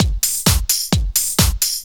straight, Techno Trance Essentials drum loops

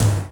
Yamaha RX-120 Tom El Low

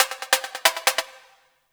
percussion loops, Techno; PS-45 WAV Techno ID

